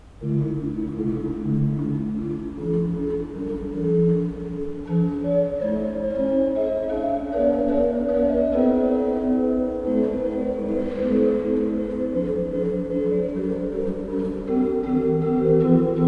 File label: pipe organs; Schuke 30 III P